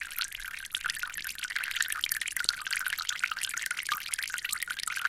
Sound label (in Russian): voda potok visokij aktivnij blizkij